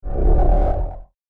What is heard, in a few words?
Ufo Waiting; Spacecraft Sound Effects; science fiction sound effect